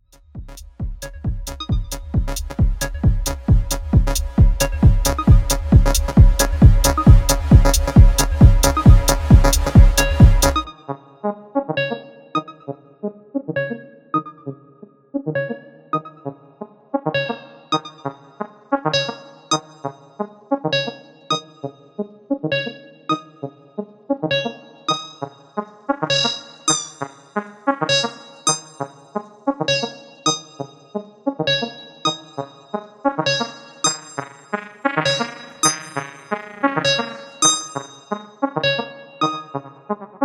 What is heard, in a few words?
Techno Belter